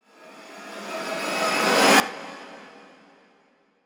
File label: Riser, Effective Trailer Risers; Federico Soler Fernandez